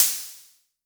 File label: Hi Hats Open; Drums; LIMAT Div Dept